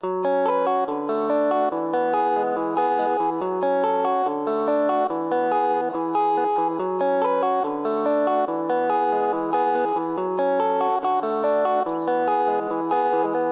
Afrodrill guitars loops 142BPM Fm; Afroplug; Lelo

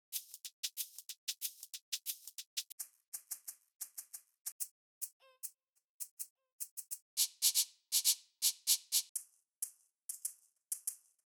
afro shaker

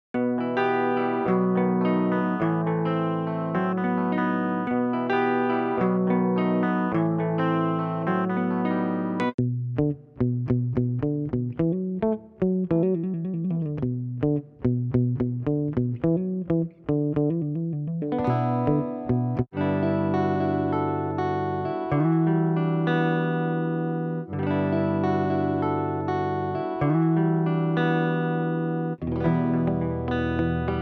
afro guitars; Dakar

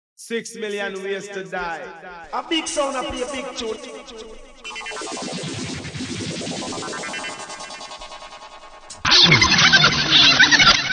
Jamaican FX

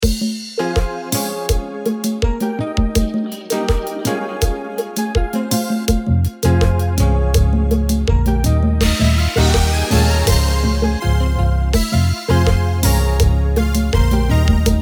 Kompa; Afroplug; Ish Samples; Kompa Starter Kit; Ms Mavy

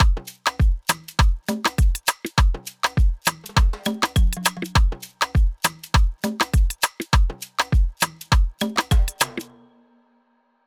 Full Drums Loop 101BPM; Burna Drums

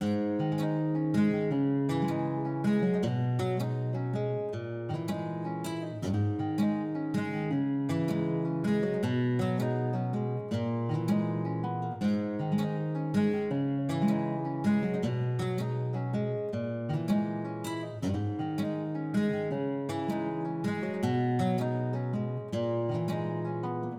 Latin Trap Guitars guitars Loop 80BPM Abmin; wave

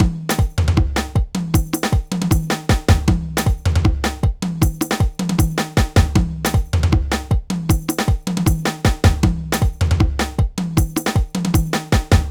totouni riddim 156 bpm

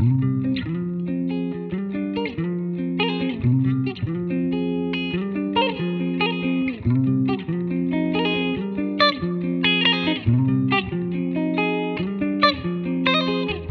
Afrodrill guitars loops 140BPM Ebmaj; Afroplug; Totori

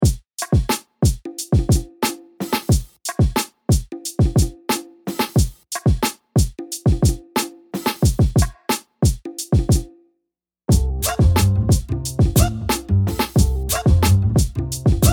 Hip Hop 90s

